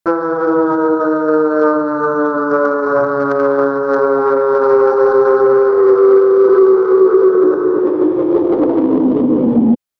appearance sound fading

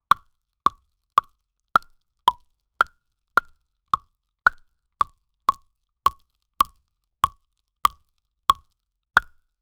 small fruit plucking soun

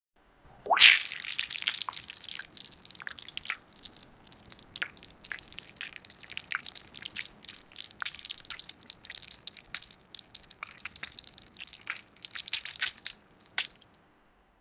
weird liquid bloody sound

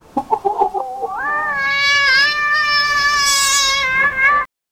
monkey sound; animal vocalizations

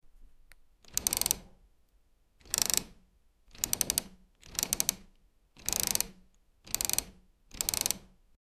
the clicking sound of the, mechanism

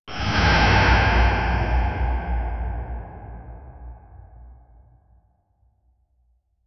the sound of a loud, cave

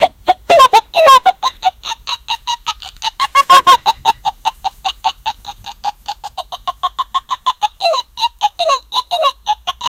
chicken sound effect funn

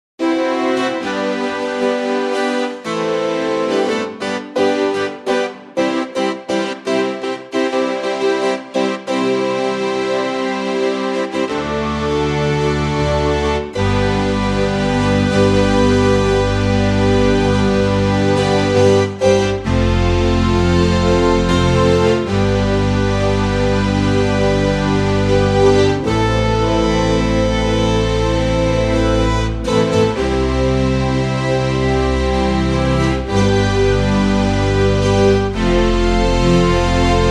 celtic dance

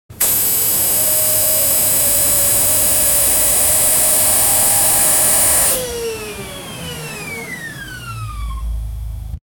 realistic medical device

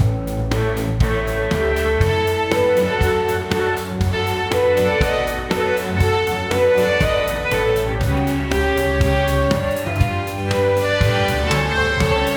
symphony orchestra playin, classical

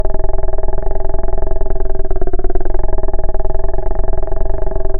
a smooth looping rolling, gameplay

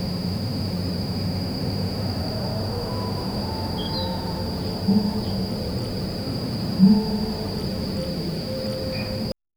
immersive early morning

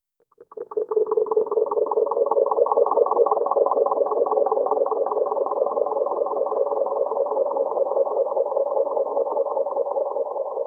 haptic vibration relaxing

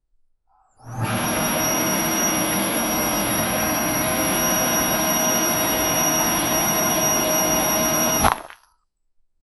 the sound of a drill

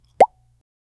soft cork pop from a; drink

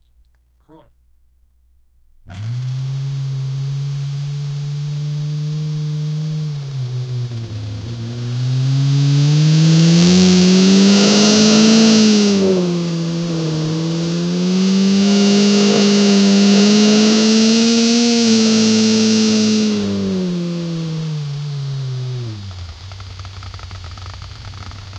rumbly cool, muscle car

